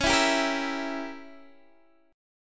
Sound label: C sharp Diminished 7th C sharp down Guitar Open D